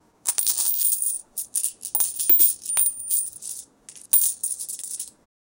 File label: the sound of receiving co, currency